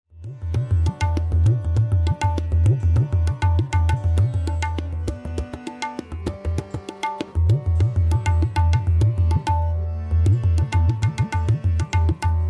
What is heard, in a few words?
rela vilambit